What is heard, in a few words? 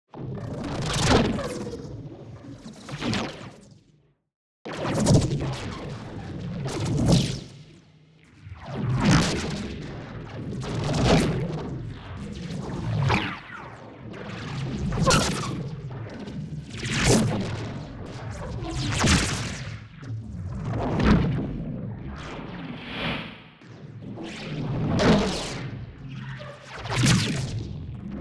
Bass Synth Wooshes; neuro; BIG5AUDIO